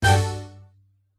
Battle Strike

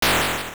Laser; Laser (rotating eye) firing

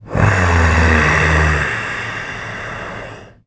Snore; Salvatore; zelda sounds

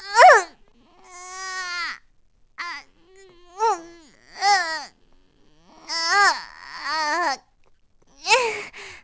Tetra Choked; Tetra choked by Ganondorf

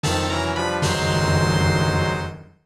Fanfare Item; OOT

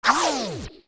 Sword Spin Magic; Charged spin-attack; OOT

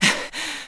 Stamina Lowest; Link running with lowest level of stamina; Skyward Sword; zelda

